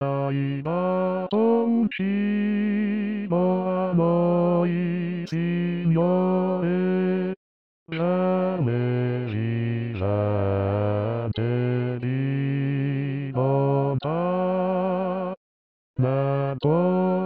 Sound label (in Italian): Hai Dato Un Cibo Bassi